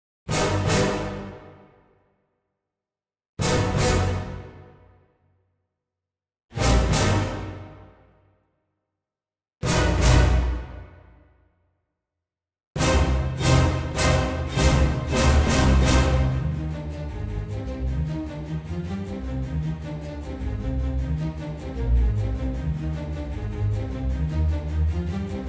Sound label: Orchestra; ProScores